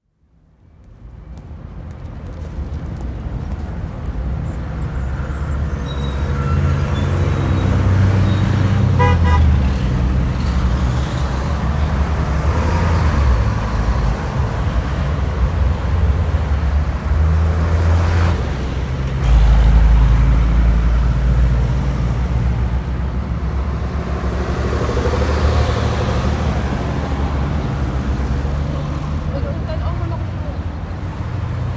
Heavy passing traffic and occasional voices and footsteps; river boat hoots at 3'14; BBC